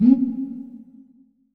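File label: CUICA, drum machines; JV-1080 DRMS; EdgeSounds; Drum Mashines VOL-1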